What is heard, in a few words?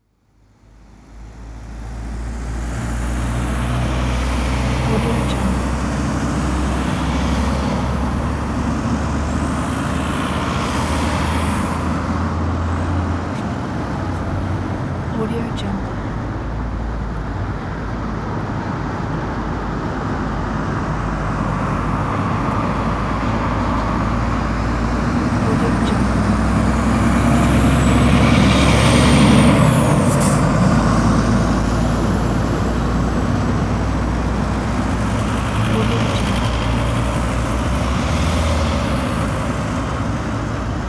town traffic